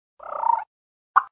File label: Cluck and Purr